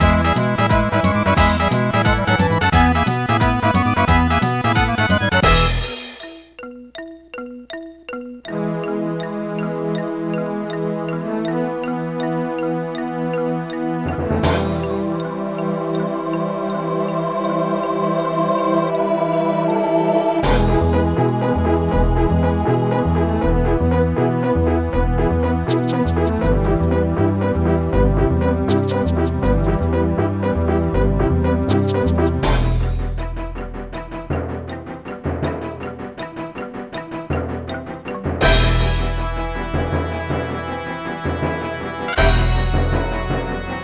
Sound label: Rocket Racer Intro; lego racers